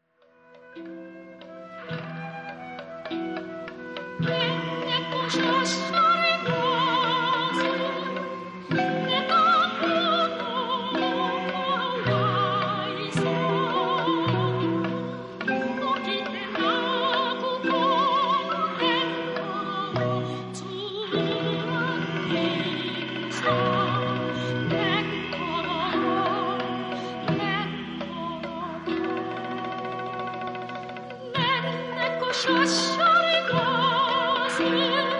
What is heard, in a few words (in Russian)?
Шедевры этнической музыки Япония